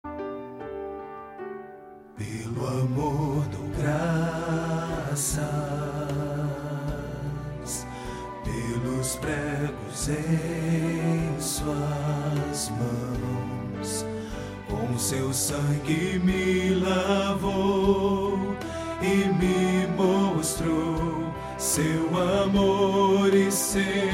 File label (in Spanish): Tenor; cantata navidena